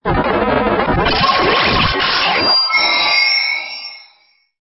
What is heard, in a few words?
Stinger; STINGERS